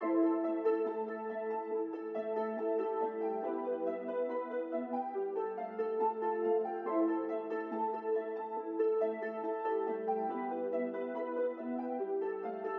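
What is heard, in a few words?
Piano 140BPM A minor Melodic Inspiring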